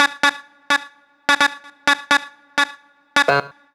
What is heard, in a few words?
House Loops, Vocal Loops House 128BPM